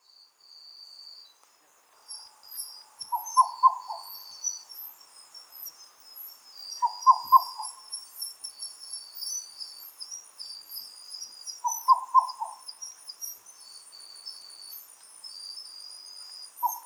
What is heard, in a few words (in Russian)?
madagaskarskaya kukushka cuculus rochii, zvuki kukushki